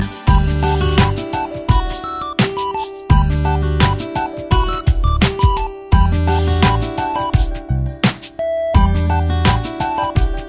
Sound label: Ultra Tone